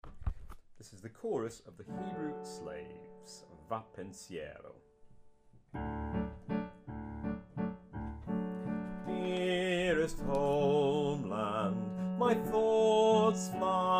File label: chorus of hebrew slave; liverpool philharmonic